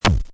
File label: knock